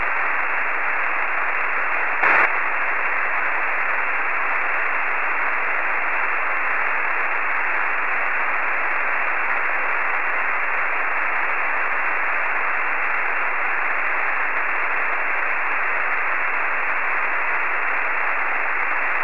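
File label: BISONSAT, satellite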